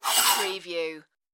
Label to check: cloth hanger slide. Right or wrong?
Right